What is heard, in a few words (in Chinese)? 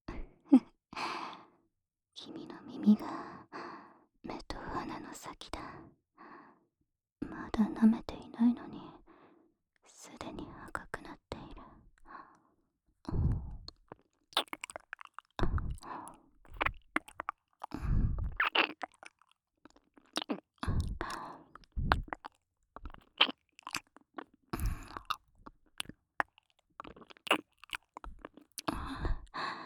舔耳侍奉（左耳）; 纱月的浓厚舔耳侍奉; 由两位恋人呈献